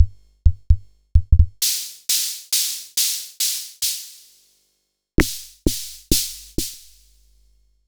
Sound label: Kent K-200